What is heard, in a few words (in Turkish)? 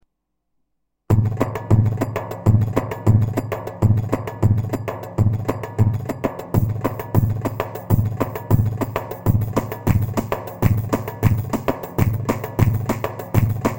pa 500 tr teke 9 16, korg; tr karisik ritimler